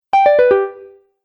Game Bonus Descending Strum, Video Game Sound Effects